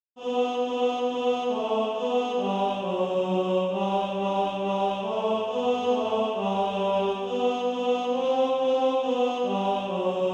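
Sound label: tenor, Practise Music; SATB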